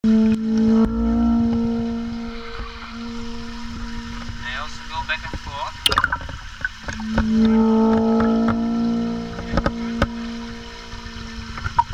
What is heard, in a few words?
waterbow